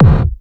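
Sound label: bass drum electronic gate; 300 Drum Machines; Yamaha Motif ES-Series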